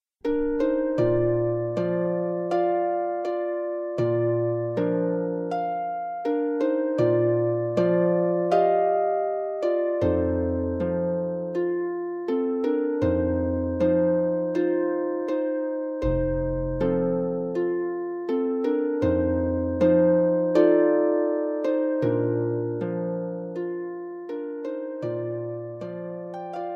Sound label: Harp; Harp Music from Argentina; Triste no 4